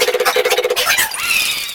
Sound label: Fan Chant; Mario Paint